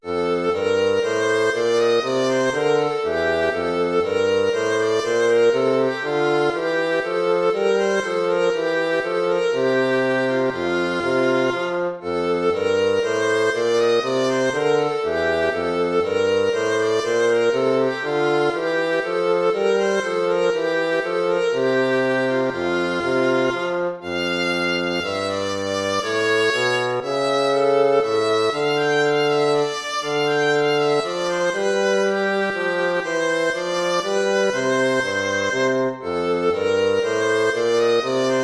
baroque minuet major

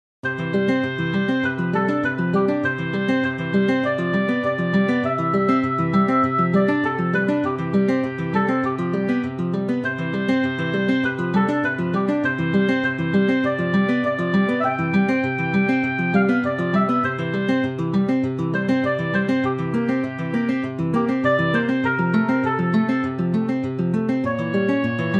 romances for guitar

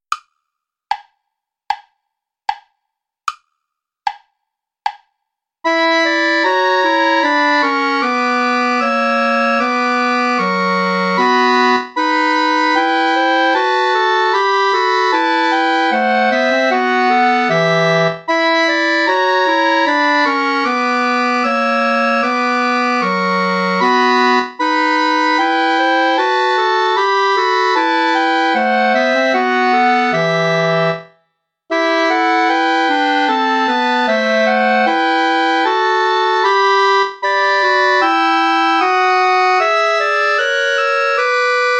play along without clarinet

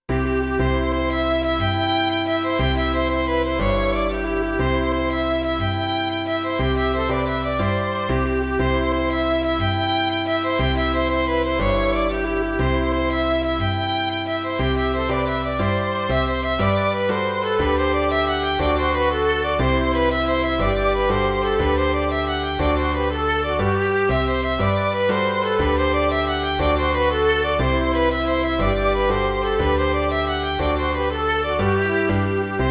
fanfare

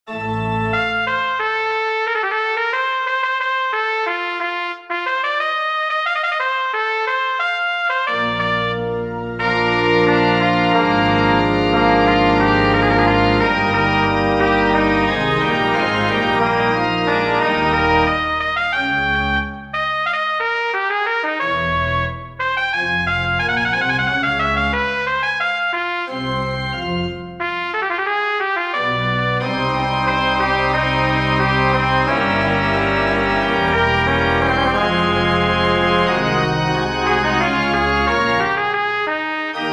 recitative for trumpet pipe organ; Johann Sebastian Bach; ich will hier bei dir stehen

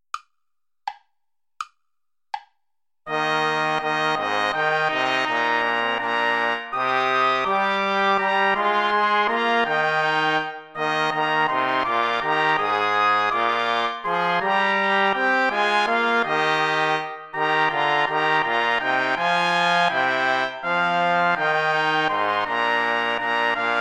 play along without trombone; praetorius michael herzlich tut mich erfreuen

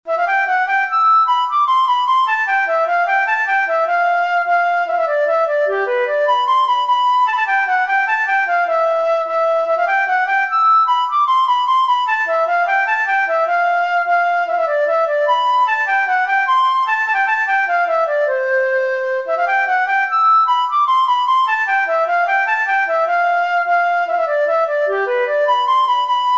the donegal jig for flute harp

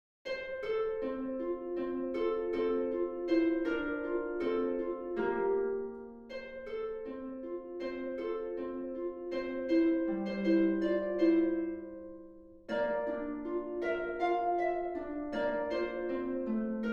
lap harp; bed with the chickens

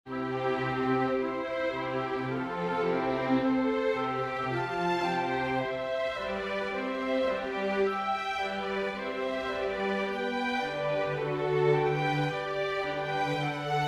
sinfonia for winds strings; purcell henry; from celebrate this festival

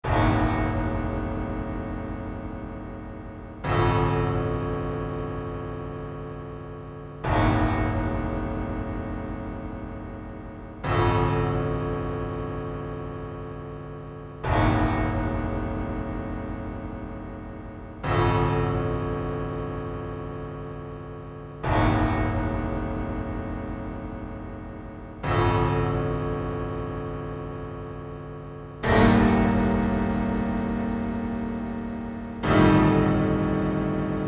for one keyboard

